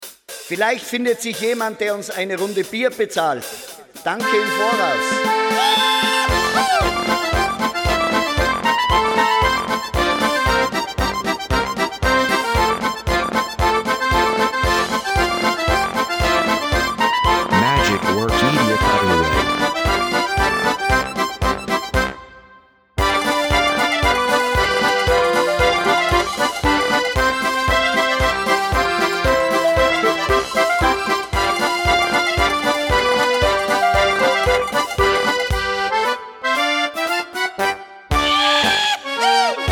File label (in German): Volksmusik